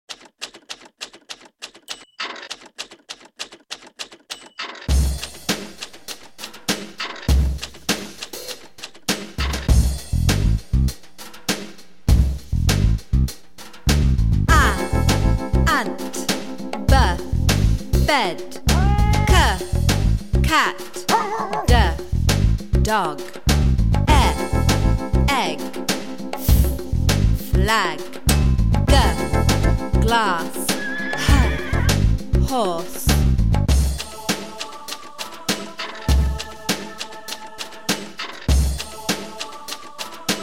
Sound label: chant a z